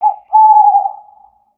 owl high, ambient outdoor

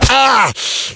bullet hit pain; game

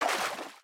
water, step

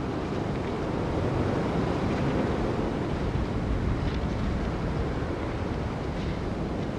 wind trees

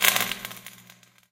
tele damage, poltergeist; monsters

